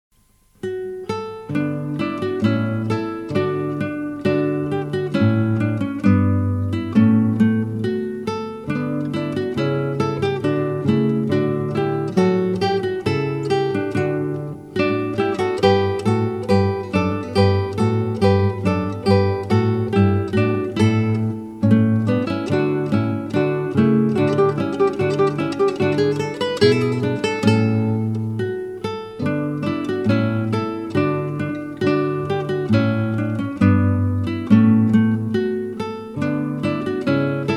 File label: gavotte